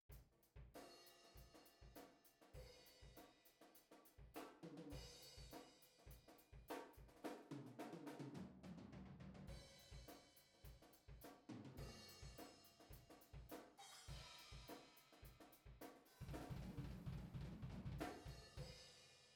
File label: mono omni room drums